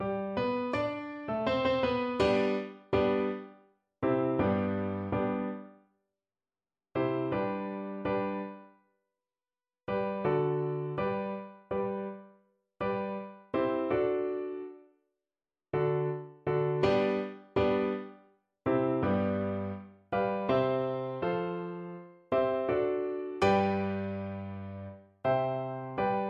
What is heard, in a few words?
Viola